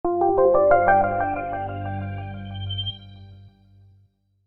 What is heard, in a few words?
Startup, Computer: Short Musical Style Phrase; Miscellaneous Musical Sound Effects; Miscellaneous Musical (Sound Effects:Musical); Stuart Duffield